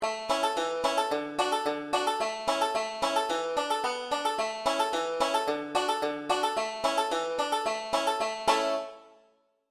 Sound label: boil cabbage acc sans melody key of a, banjo; beginning 5 string